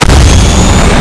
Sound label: Nitro Start; Nicktoons Nitro; arcade